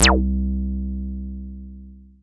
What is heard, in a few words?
Synths BASS